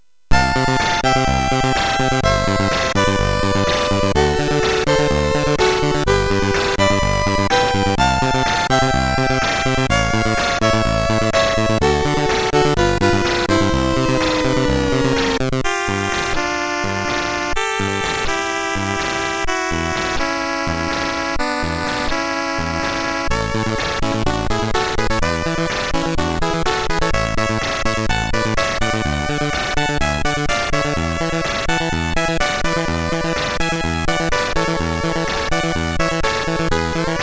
Synth Sample, MOS6581R3; Final Synth Sample II; hvsc

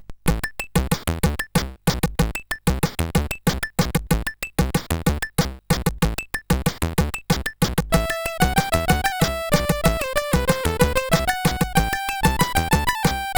Age of Serpents, sid CSG8580R5

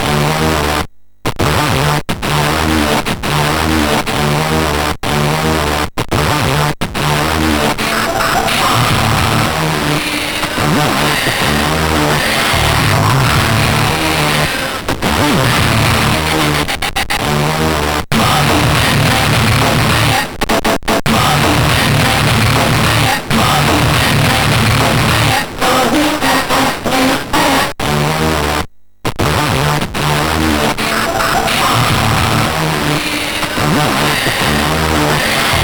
intro, MOS6581R3; Emphatic 4

